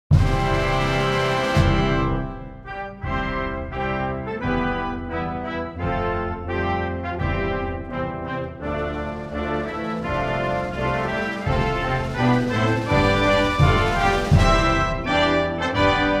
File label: Russia National Anthem